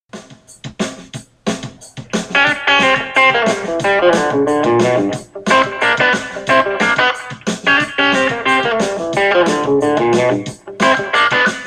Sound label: funky country lick in g